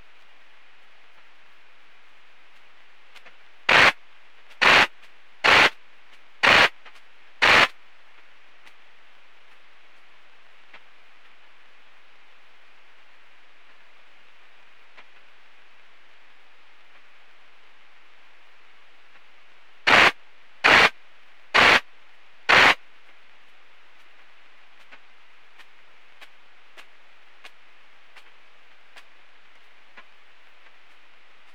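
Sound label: R poneli heterodyne 19° captif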